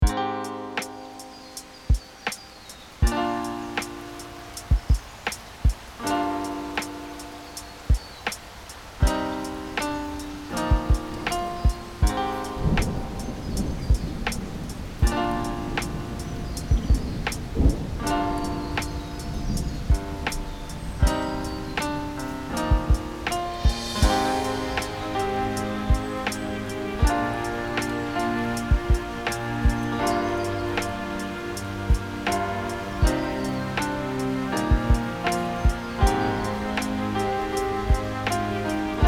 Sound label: rainy village 8 bit lofi